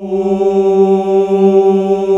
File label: male voice G2; AKAI S-Series CD-ROM Sound Library VOL-2